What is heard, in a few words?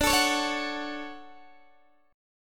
D Major 7th, D, down, Guitar DADGAD